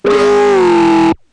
hard robot boss; gonner linux macos ps4 switch windows xbox one gamerip 2016

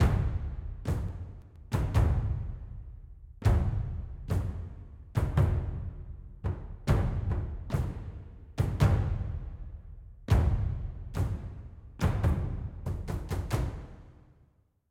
Music Battle

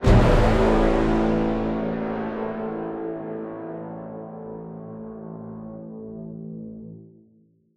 Run Fail

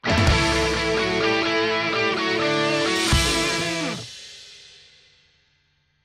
Large Celebration; Disney Infinity

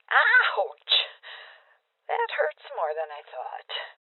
Voices Armor Shot Female Old; rebel cops 2019 gamerip

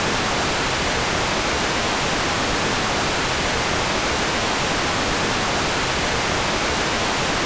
Ball Sounds; pangya fantasy golf pc

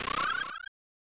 Power Up

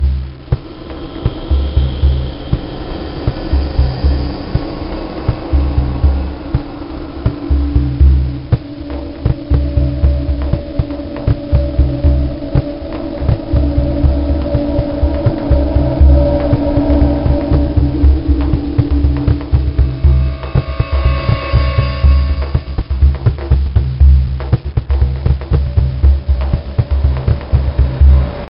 Loop

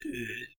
Monster Strain

